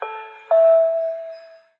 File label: station